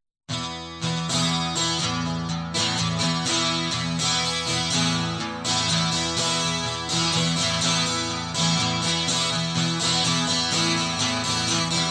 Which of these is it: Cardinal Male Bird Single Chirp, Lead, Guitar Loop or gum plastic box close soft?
Guitar Loop